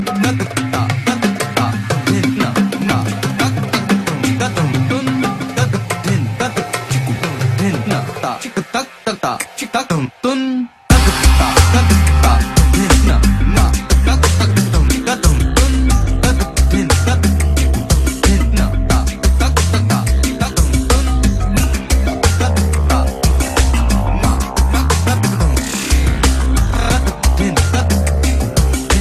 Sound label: Arabic